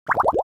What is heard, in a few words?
Cartoon water bubbles short